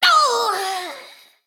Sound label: female mechagnome, Death